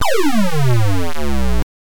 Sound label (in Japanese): ワープ; Arkanoid; PC-88